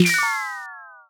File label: gift energy